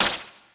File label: high quality short reverb steel snare drum sample f key